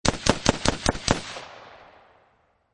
Machine Gun Colt Ar-15 Multiple Shots Exterior At 45Ft; Machine gun: Colt AR-15, Multiple shots, at 45 feet.